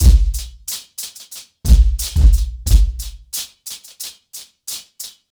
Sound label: 90BPM, ACOUSTIC DRUMS